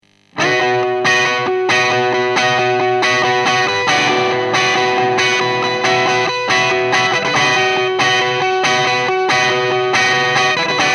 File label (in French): P90 bright, Canal 2, P90 position bridge, tone réglé "brillant"; White Jack, un 3 canaux; AMPLI